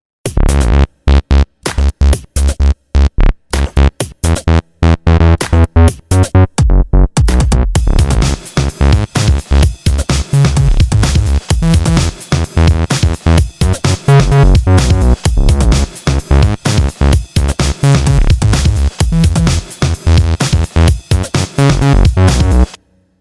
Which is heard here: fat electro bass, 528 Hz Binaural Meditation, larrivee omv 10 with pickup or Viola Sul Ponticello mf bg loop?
fat electro bass